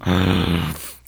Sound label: ahhh